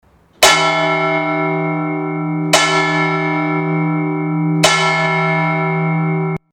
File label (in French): cloche; Tibiran-Jaunac, église de Tibiran